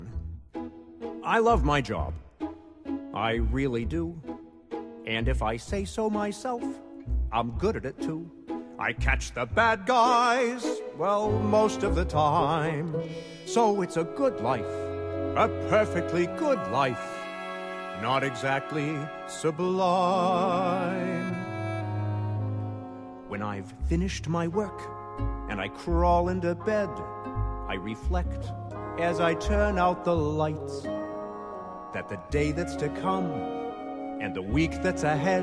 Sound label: Male